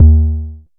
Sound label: analog tonal, Kickbank